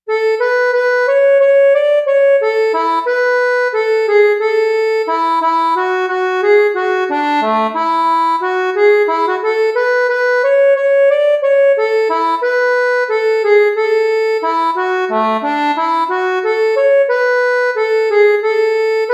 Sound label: luas dance slow